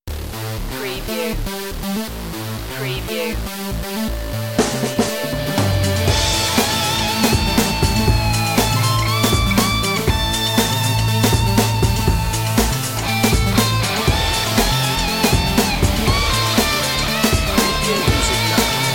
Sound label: beat